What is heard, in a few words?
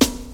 hip hop acoustic snare e key